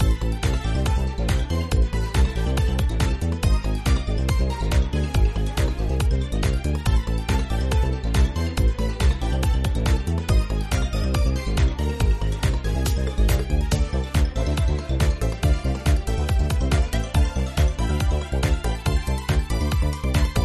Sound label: Music Festival Island Battle Music; dragon city